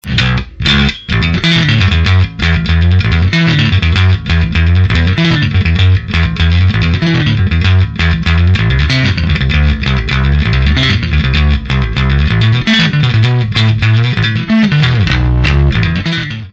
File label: bass